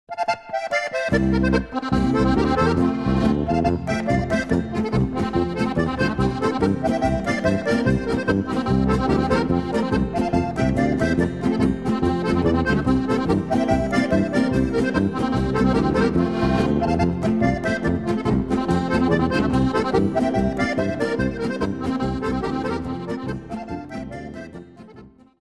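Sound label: schnurbart polka, Steirische Harmonika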